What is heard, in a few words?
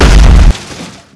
Vehicle Collision